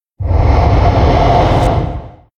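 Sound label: giant lynx spawn; monster; Senillinea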